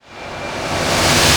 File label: VFX Short Fills & Reverse